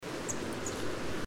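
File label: marsh tit palustris, birds in flight; goethewitz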